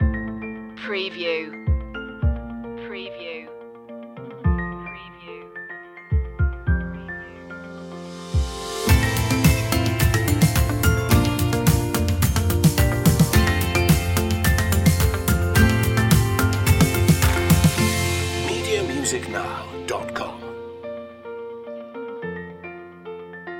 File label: taking flight no vocals